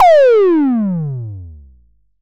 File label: Tom Fat; Drums